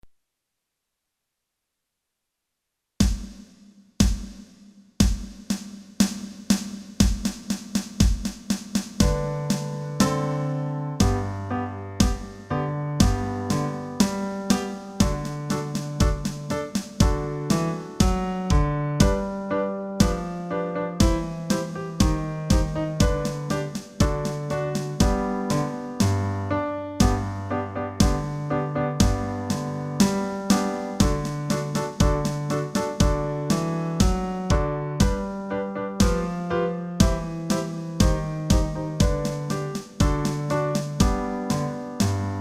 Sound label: Duke in F